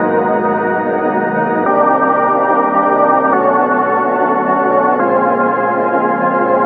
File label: Pad 144 BPM D#, Club Techno; Zero-G; Groove Loops